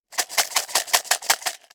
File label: Household Paperclips Plastic Cup Rattles